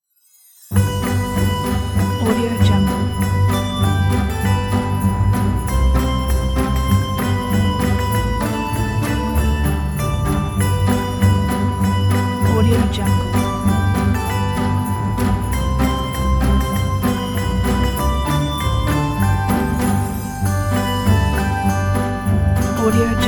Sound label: jingle bells